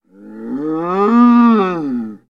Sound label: Cattle, Cow Bellow. Single Bellow. Close Perspective.